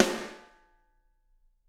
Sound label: SD, Drums; Bob Clearmountain Drums I